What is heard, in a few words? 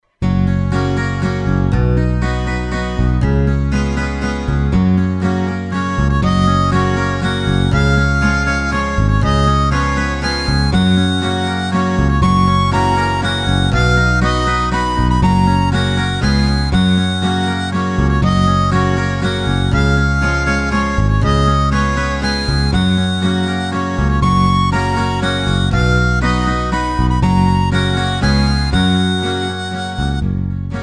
Happy Birthday to you in Gb